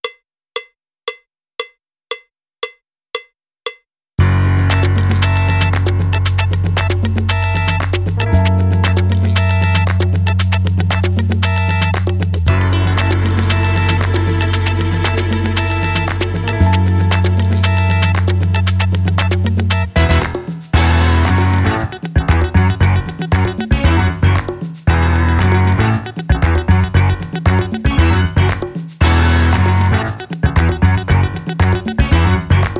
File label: Way Back Funk drumless